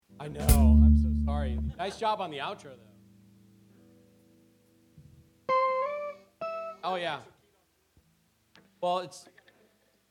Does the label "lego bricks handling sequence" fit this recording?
No